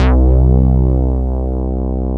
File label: C2, SYNTHESIZER; AKAI S6000 CD-ROM - Volume 1; GLUNGE